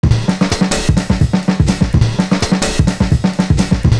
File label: JAZZ SOLO; Univers Sons - Kitch 70s